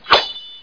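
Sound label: SWORD HIT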